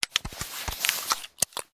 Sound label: gun check; soundscrate